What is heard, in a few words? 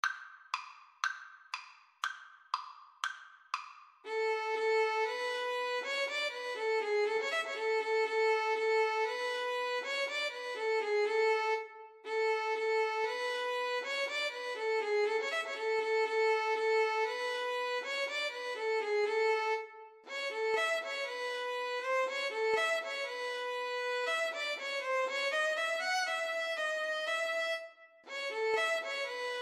Violin Viola Duet